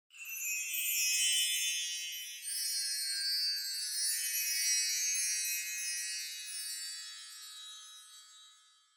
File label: Wind Chimes Reverb Short